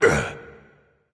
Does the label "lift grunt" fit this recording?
Yes